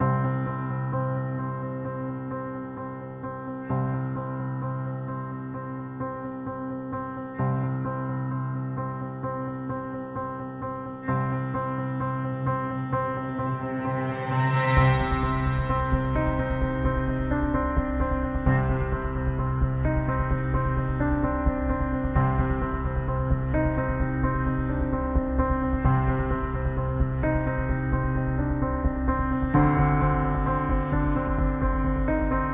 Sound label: No Percussion